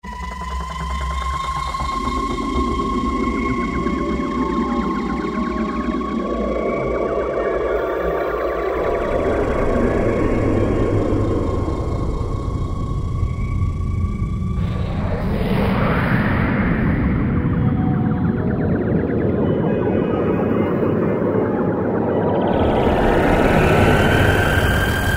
Korg N364 Factory Combi; Factory Combi demos

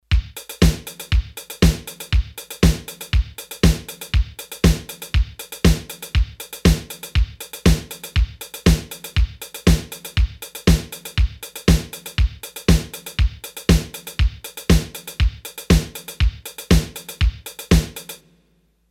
Disco, Roland D-10; Rhythm Demos

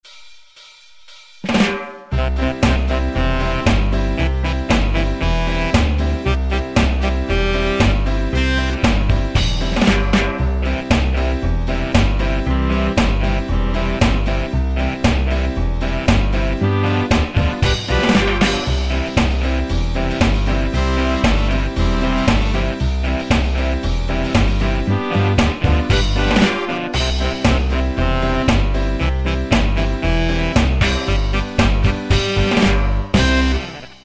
Ska, Yamaha QY70 Preset Styles

